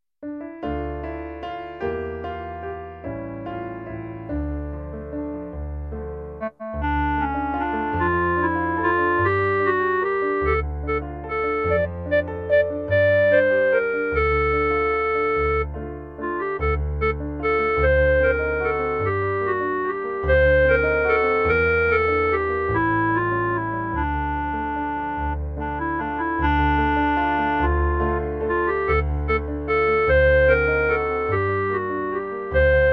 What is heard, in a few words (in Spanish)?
CLARINETE